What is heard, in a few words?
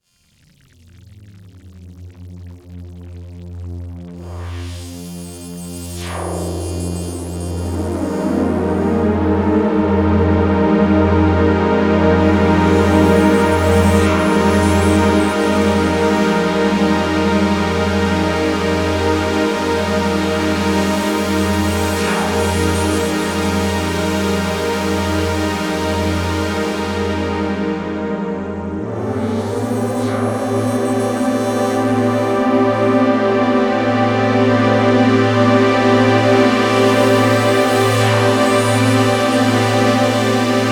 RS6 Ambient Skies; synthesizers; Redshift 6; supercritical synthesizers